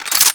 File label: Reload Complete, Weapons; Strun